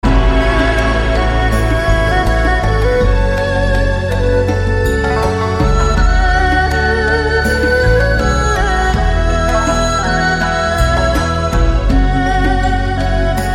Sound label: tibetan music ringtone